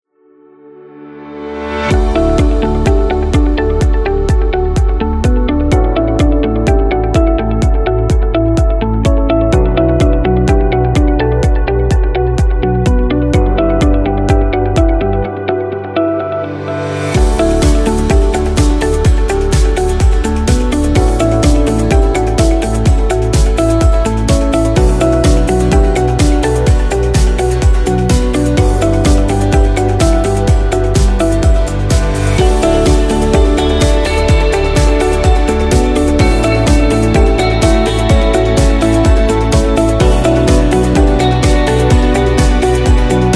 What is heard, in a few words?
Corporate Beat